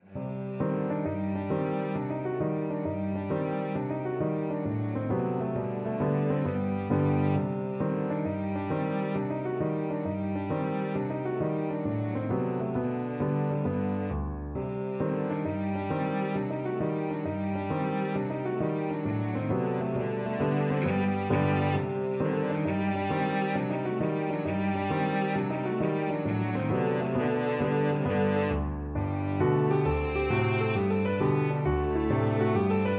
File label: cello